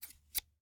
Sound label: Scissors, snap, snip, shear, med large, single; Eiravaein Works; Vaeyan IV